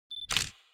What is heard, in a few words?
Camera Shutter Click Design Simple Beep And Shutter Click; 5.1 (LCRLsRsLf); Hollywood Cameras